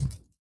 Hand Paddle Attack Extra Light

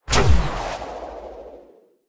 Ball Cannon Fire; Deathrun

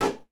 Cricket Bat

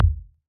Ball Collision Geo Tackleball Med

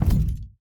Hand Paddle Attack Hard

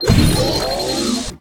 Player Grab Boost Large With Beeps

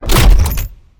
tackle; Player Tackle

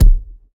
Ball Player Hand Hit Tackleball Hard

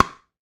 Pickleball Paddle Hit Ball Hard

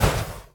Ion Thrust Combined Loop; Player

